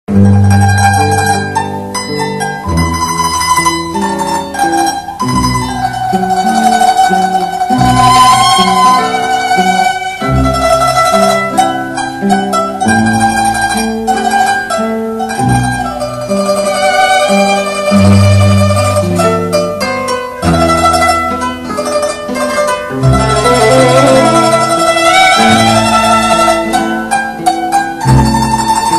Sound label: mandolin